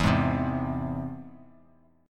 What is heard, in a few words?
E Augmented E; E+ strummed; JGuitar